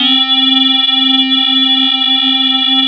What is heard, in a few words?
ROLAND MKS-80; LOGOS LIVE; Rubber Chicken Software Sample Bank